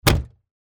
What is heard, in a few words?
Car Close Trunk